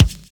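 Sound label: bass drum n shaker; Univers Sons - Kitch 70s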